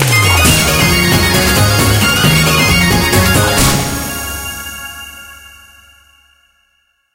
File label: jackpot mega win; irish pot luck